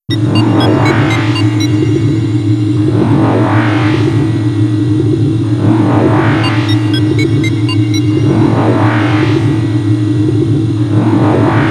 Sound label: Elevator Theme (With Ambience); Metroid Prime